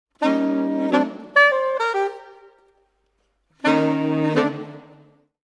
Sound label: Brass, Jazz for piano